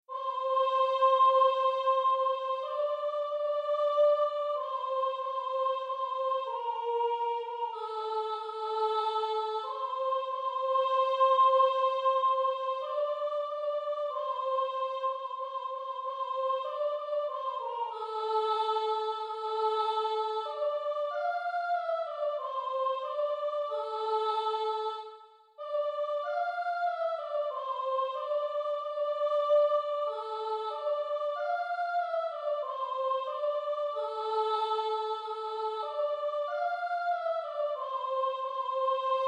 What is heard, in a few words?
deep river rhapsody alto; Negro spiritual; Choeur 3 voix SAT